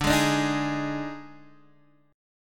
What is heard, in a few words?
D sharp Major 7th Suspended 4th, Guitar